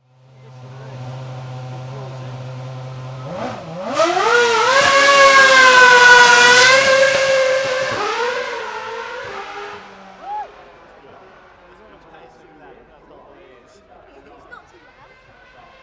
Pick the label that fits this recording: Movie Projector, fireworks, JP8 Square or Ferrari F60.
Ferrari F60